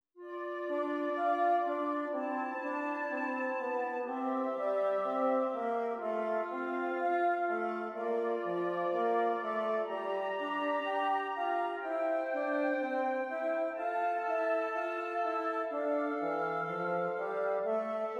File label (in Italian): Esercizi di contrappunto a tre voci; Zweite Gattung; Ronge pagina 62